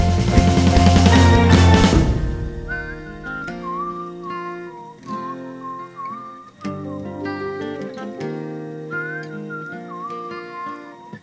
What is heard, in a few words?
whistle